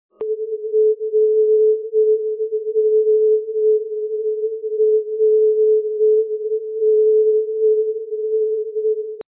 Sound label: 0.585MHz